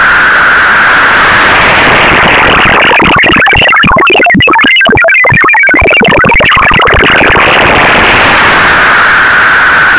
la frecuencia de modulacion

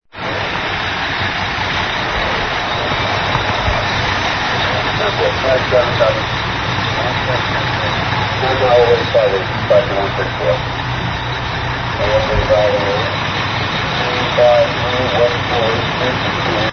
Incendio incendi

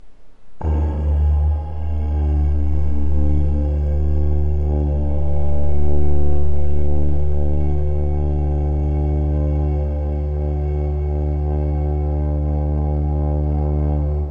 bajo

